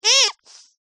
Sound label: leisure toy rubber duck squeeze squeak